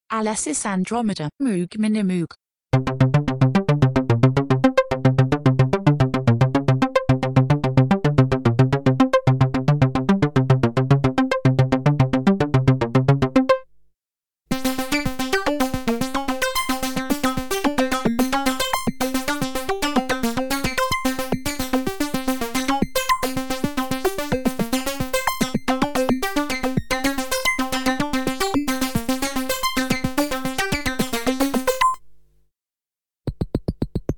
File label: A6 Minimoog Env